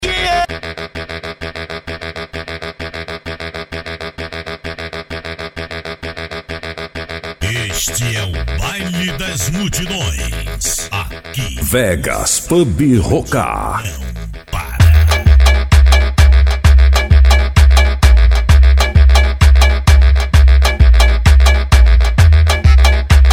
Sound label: funk mega bass